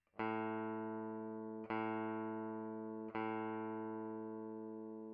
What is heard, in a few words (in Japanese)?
5弦の「A」